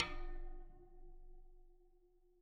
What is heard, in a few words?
Bicycle Spoke